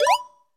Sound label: Trample Combo; SMG